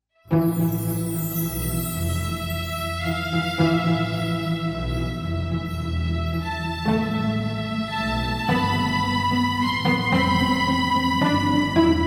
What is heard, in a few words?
Eerie Suspense